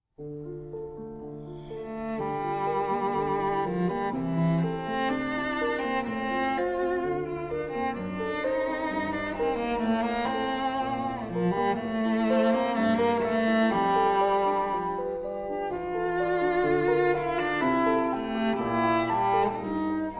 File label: classic music sample